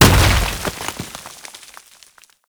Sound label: Scan Pillar Plant Debris; Warframe; Frumentarius; Scan Ability